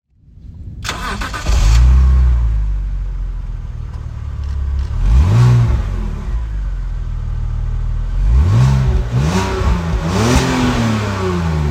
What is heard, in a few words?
BMW Z4; BMW Z4 3.0